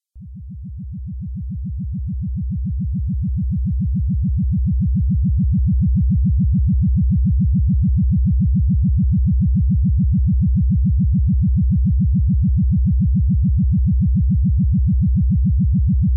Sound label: Laser Subtle Pulse Beam